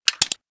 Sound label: sight, rpg26; tacz; RFP v1.0.0 beta2